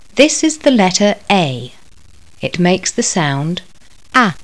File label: A LEAD